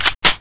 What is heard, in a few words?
draw; balrog1